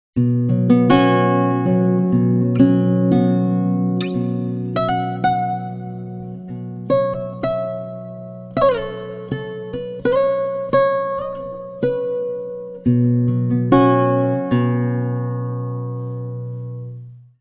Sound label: pitchshift guitar; bias peak